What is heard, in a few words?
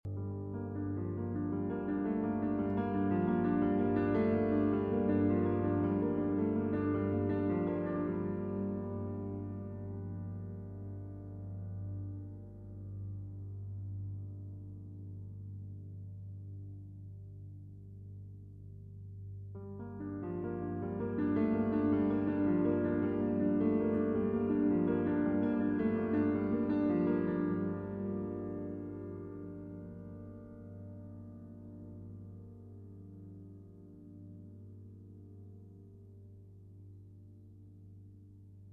Sonata in G Minor For Solo Piano, Trio - Sospeso, Dolcemente; No. 1; Con Moto